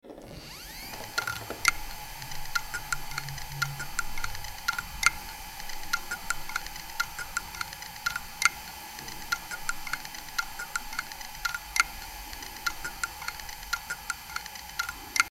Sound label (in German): mk2018gap, Festplatten Geraeusche